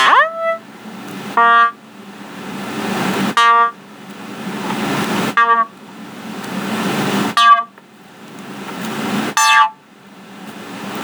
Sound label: RINGTONE